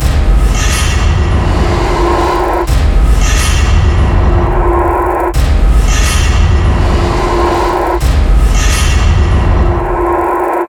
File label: Music Combat Devourer Main; The Sinking City